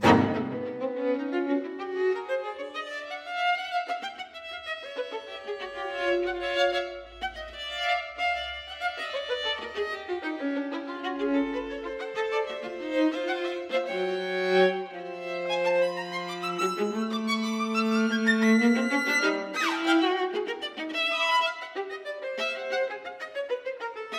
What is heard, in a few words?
string quartet leggiero